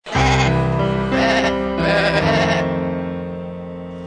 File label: sheep